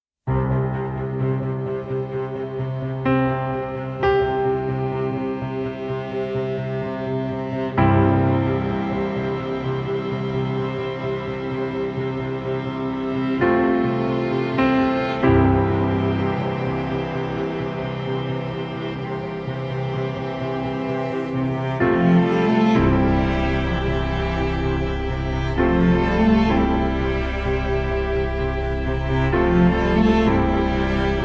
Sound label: Piano-Quintet Version